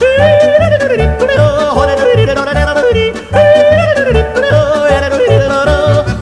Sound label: yodel